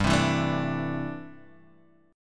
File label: C 6th Add 9th G